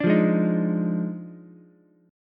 Eb Major 7th Suspended 2nd Suspended 4th Eb Ukulele; strummed; 7,5,4,6; EbM7sus2sus4